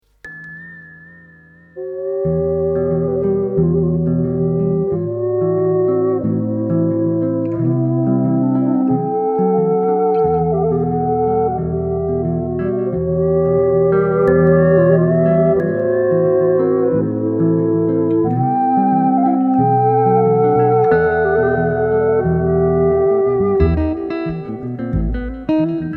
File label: One Horse Tango